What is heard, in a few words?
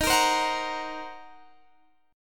Eb Minor 6th, Guitar Open D